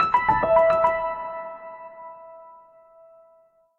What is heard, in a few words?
Reflective Piano Arp, Emin 107 bpm; MIDI Clips; Tonal; Motifs; Ableton; Mood Reel